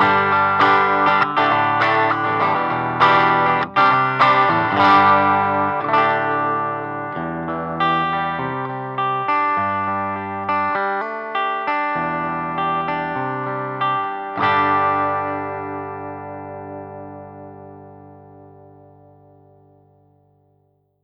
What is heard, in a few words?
stock cub 8 half gain; laney